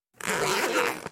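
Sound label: Quick Luggage Zip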